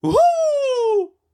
into ceiling; Collector

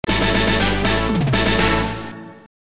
Scene Clear Jingle

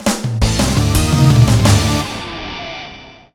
Jingle VS Mode; Photo Dojo; DSiWare

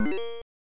Item Chime; Carried Item Pickup

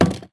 thick plastic hard

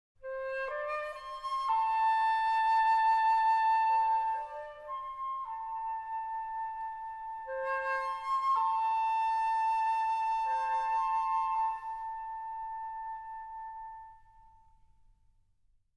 Echoing Flute; Dragon Quest II; Symphonic Suite Dragon Quest

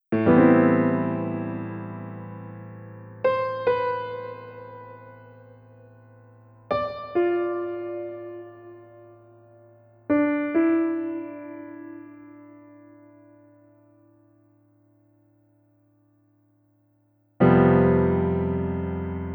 Main Theme A (Piano); The Dark Spire

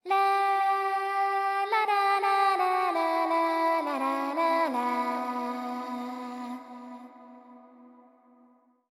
BGM ADV Prayer; aster tatariqus android ios mobile gamerip 2023

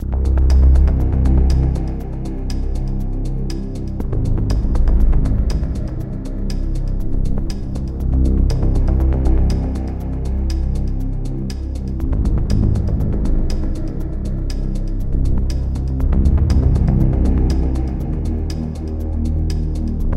End Music; volt windows gamerip 2014

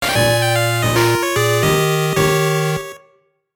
Lose Life; gb

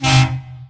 Vehicles; Hellbender

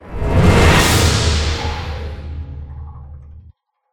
Transition

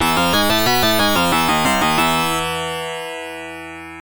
Got Password Jingle; Titus the Fox; To Marrakech and Back

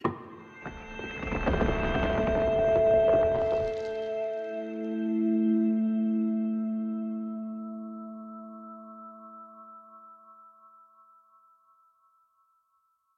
Jingles

